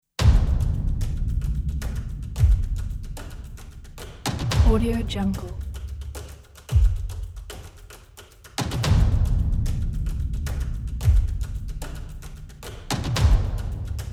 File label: epic inspiring drums